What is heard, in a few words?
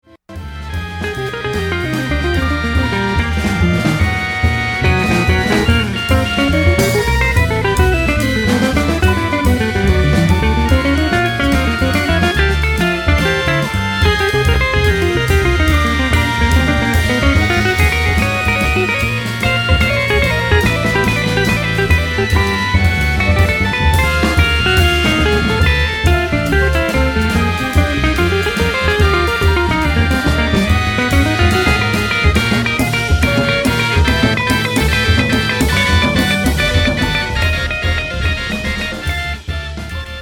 mod jazz